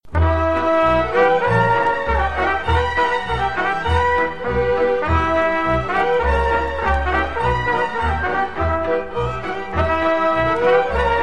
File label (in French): Arcanul Batrinesc, Roumanie